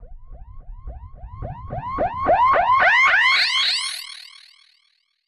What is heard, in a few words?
Filter Proc, alarm siren samples, 120bpm